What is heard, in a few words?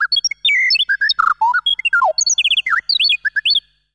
Birdcall